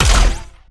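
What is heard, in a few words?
Laser gun Flanger Fire Heavy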